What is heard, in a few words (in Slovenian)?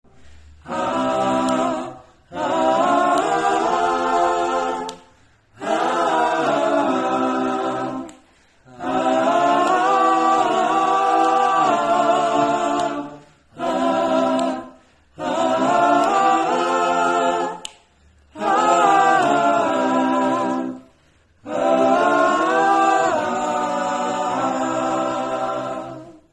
kvir zborcek